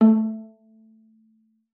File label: A3, VIOLA PIZZ; Xsample VOL-5; 90 s Sample CDs